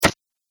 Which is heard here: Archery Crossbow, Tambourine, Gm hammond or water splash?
Archery Crossbow